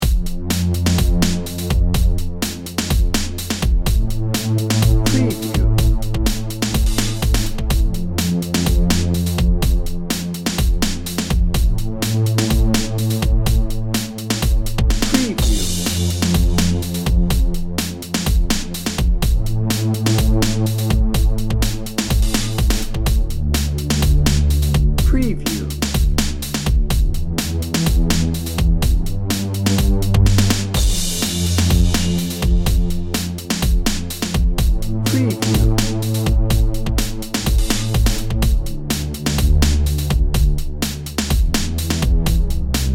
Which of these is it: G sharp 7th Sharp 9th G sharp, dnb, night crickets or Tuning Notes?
dnb